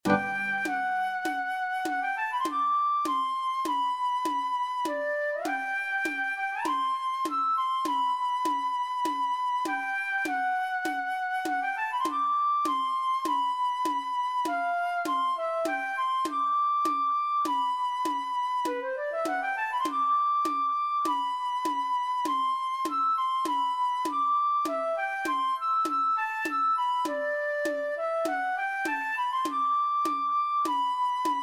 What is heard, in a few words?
flute